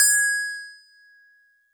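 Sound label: StarPiano DX100 G#6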